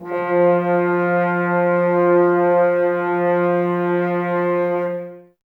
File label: F, Brass, F.Horns, Woodwind Brass Ensemble; Sample CDs; Miroslav Vitous